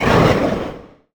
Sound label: Swampmon hit; SwampOfDarkness